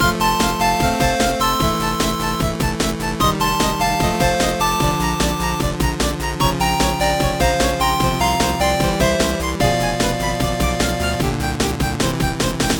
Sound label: 8 Bit Game Intro Loop